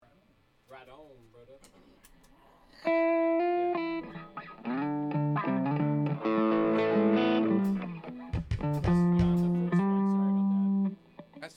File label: Strings Only